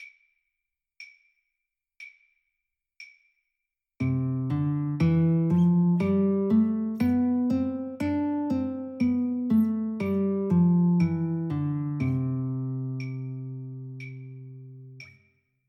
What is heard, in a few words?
Eighth, banjo; Beyond Chord Melody Volume II